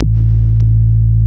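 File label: wall moog, bass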